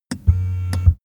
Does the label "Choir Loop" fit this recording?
No